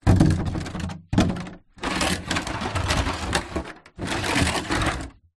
foley water cannister plastic 10 ltr movement concrete ground; zapsplat